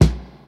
steel kick drum sound b key, bass drum tuned to the B note